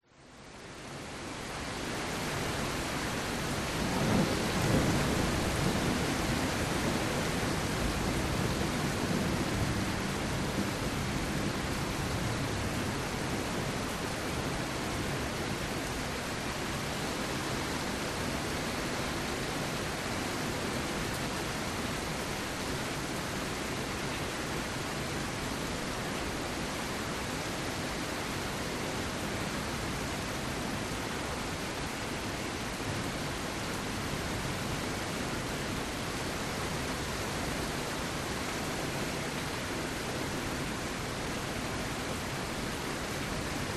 nature rain internal home rec open window occ cars pass low thunder rumbles; zapsplat